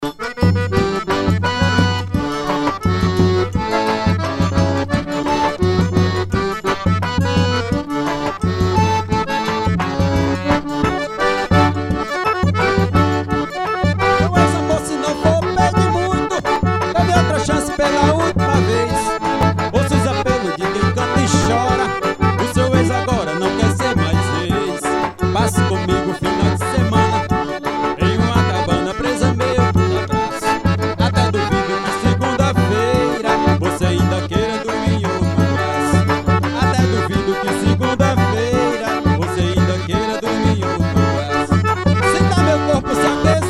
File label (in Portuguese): forró